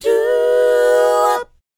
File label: DOWOP C#, Vocal R&B DOO WOP; ILIO; Vocal Planet